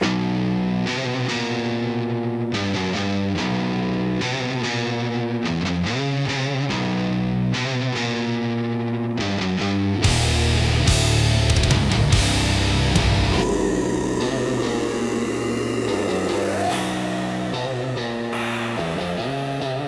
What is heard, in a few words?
death metal